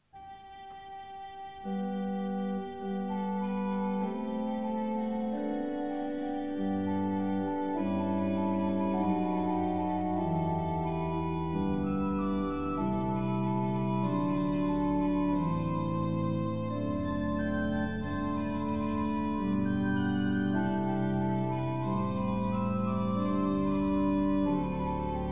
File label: Wenn wir in höchsten Nöten sein, organ; Orgelbüchlein; Bach; Bach Organ Works; Choral Preludes